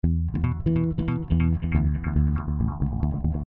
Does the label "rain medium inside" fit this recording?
No